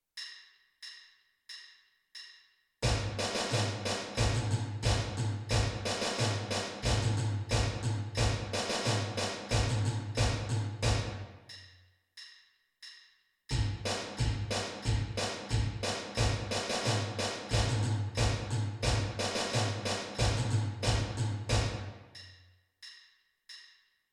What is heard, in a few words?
the conquering hero snare drum bass drum parts a b